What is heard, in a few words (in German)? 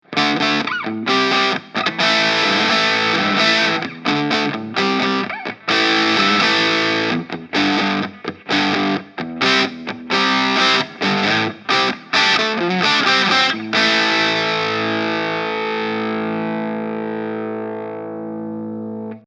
Ibanez AS93FM steg zerre